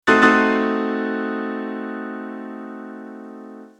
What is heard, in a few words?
Horrifying Shock